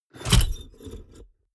mechanics medium snap

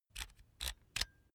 electric drill template adjust soft; maschinen; bohrer; soundpool

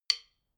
drumstick hit soft, percussion

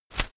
whoosh shredded paper; swooshes-swishes

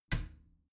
bathroom cabinet warped close soft; objekte; tueren

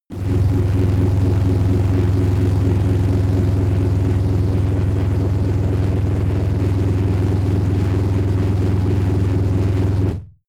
noise rumbler; musikelemente; drones

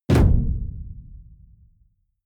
sci-fi shot tonal; schusswaffen

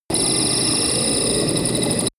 extrinsic crickets; energie

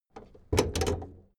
car camaro open hood

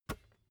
basketball catch gentle

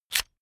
electric drill template slide out fast; maschinen; bohrer; soundpool

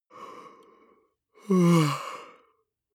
male yawn vocal soft; koerpergeraeusche; menschen; soundpool

